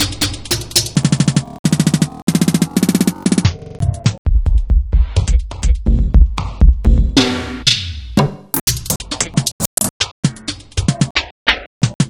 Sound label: New England Digital Synclavier